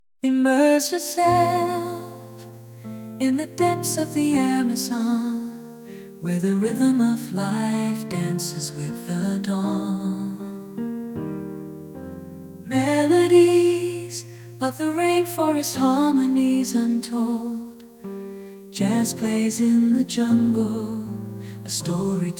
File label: Jazz